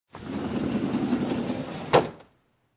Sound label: Sliding Door close